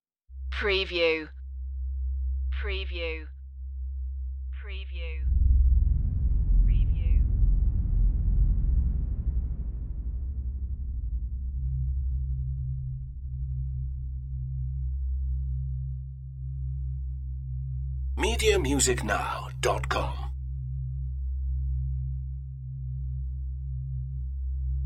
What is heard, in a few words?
ambient dark beauty